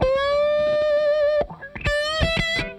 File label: DRIVER LICK, Funk Guitar; 90 s Sample CDs; Zero G